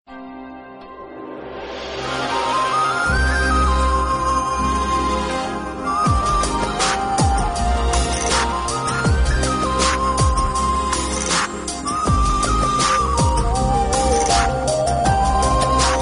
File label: flute remix; Airtel